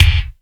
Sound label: MIX KICK, FUNK KICK